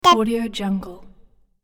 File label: cute character voice